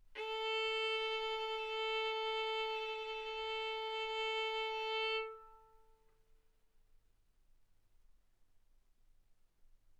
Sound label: Violin arco mf sul A A4 B4 stereo